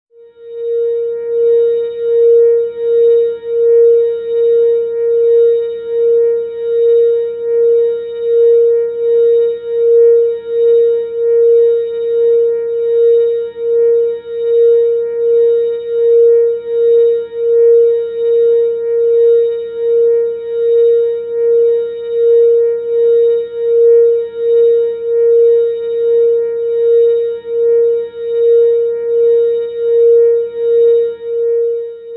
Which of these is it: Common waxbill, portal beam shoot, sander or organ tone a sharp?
organ tone a sharp